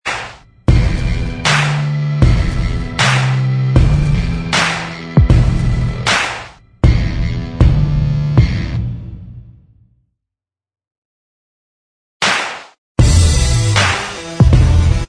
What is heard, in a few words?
Inst Track, backing tracks